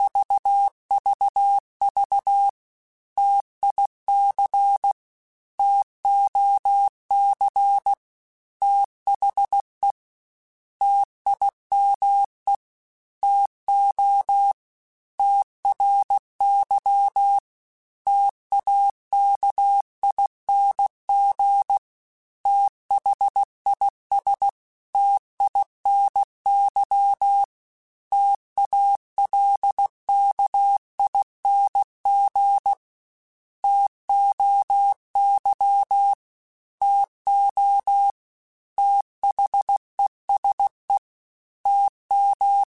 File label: T Runs, Morse Code; HamRadio; Prefixes